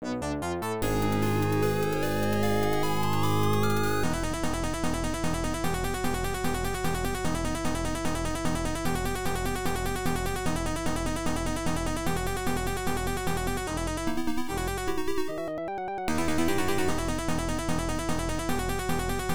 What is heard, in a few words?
Surprise; Dragon Slayer The Legend of Heroes II; PC-9801 OPN